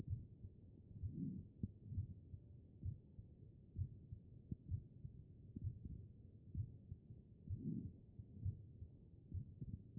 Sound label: HEART; B MODE